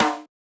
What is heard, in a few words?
snare samples, Rock